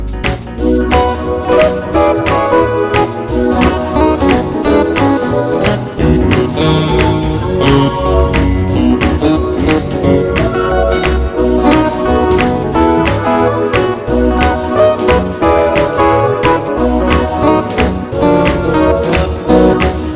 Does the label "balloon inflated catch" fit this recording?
No